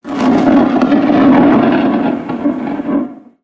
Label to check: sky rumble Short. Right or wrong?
Right